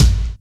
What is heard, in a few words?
raw kick drum sample g sharp key; kick drum samples